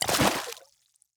water walk; skyrim

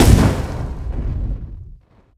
firebolt impact; skyrim

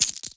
gold up; skyrim

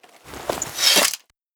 blade 1 hand sheathe; equip

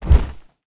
bear foot front; npc

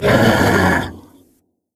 npc draugr aware

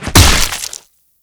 kill smash

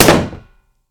shield heavy block small

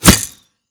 bow fire

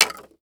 bones

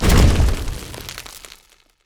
alteration stoneflesh fire; ironflesh; skyrim

